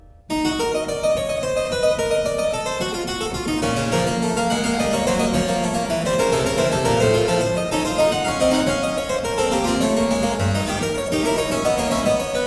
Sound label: Fuga, Keyboard Works, The Well-Tempered Clavier; BWV 855 e II; Klassika; Bach, Johann Sebastian